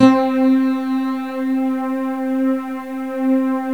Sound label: Atmosphere, Synth SFX; GMTones